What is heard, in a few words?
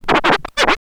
TURNTABLES, Hip Hop